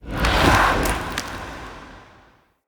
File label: Mockery Foley Husk Exit; Enemy; Mockeries; Characters